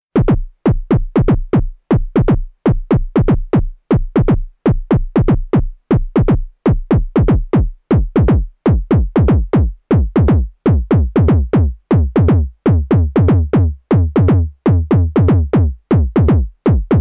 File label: Sequential Trigon 6 Kick